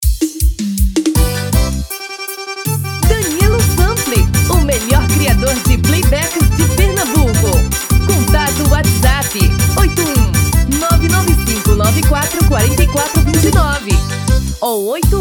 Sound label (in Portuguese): Rancheira, Arrasta pe